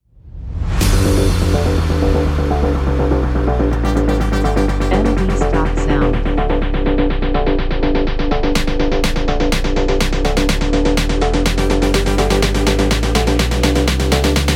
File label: Pulse Engine